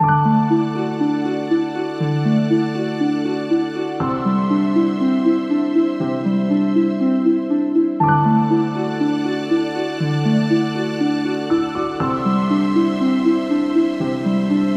ice cave; stendhal

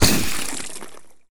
plasmaball impact

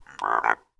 mutant frog

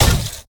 plasma body impact

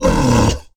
hurt, aliens; ortnok

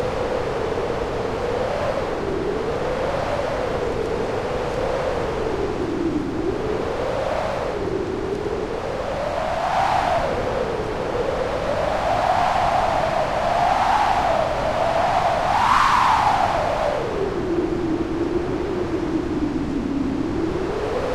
wind heavy; weather

